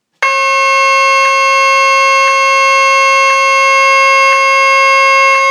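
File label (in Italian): NOTA FISSA, Sirena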